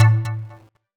CURSOR NG; gamerip; PS3

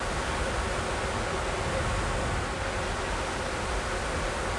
Steam Vent Idle

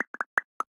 Slime Aggro; Going Under

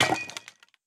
Item Breaks; Going Under

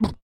Hurt; Joblin

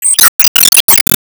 Enemy Box Charge

